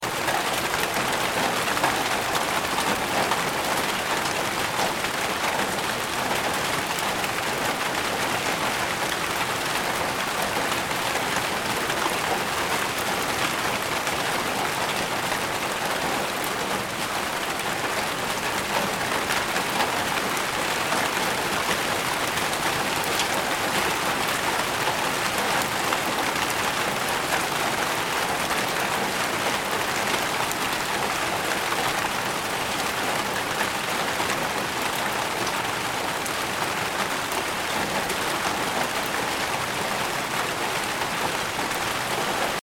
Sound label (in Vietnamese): Tieng on trang, tieng mua roi lop dop